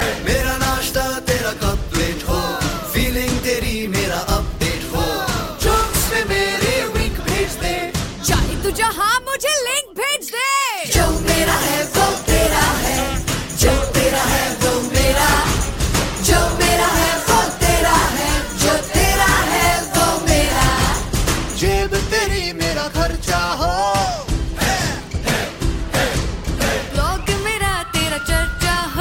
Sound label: tone; airtel